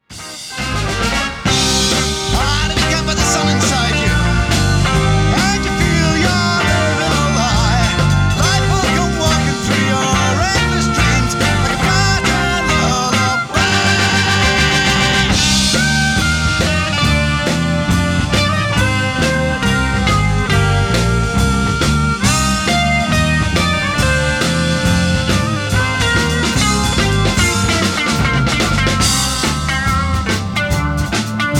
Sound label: Blues Lullaby